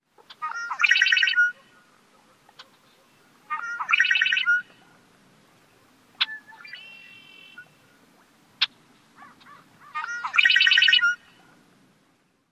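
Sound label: songs and chack calls, Red-winged Blackbird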